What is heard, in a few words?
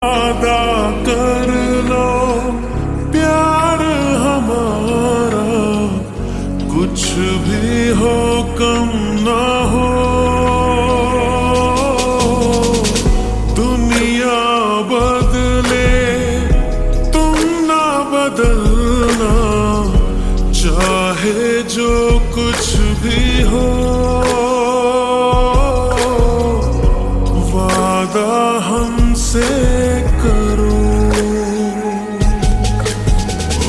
Ringtone Slowed Reverb